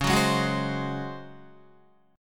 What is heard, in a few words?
Db Major 7th Suspended 4th Db down Guitar; strummed; JGuitar